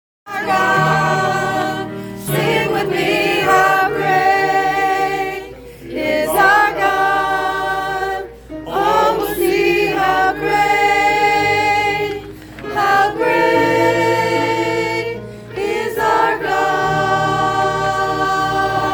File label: alto